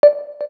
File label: KEY XYLOPHONE 9, keytone; Samsung SGH-T369 Linx